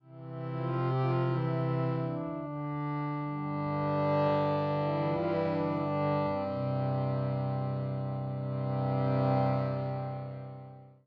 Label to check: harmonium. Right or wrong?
Right